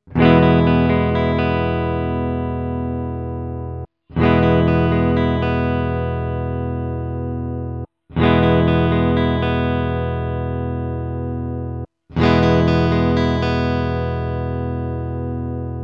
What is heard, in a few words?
hb neck strum